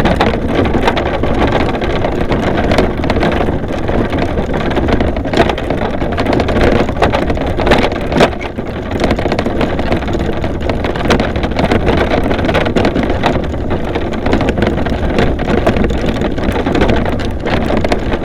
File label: riding in wagon